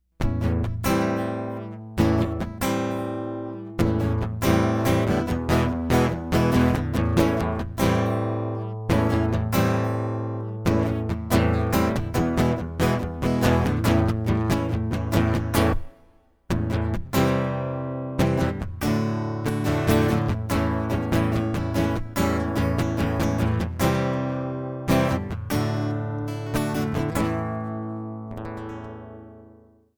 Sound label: acoustic; Drop Kick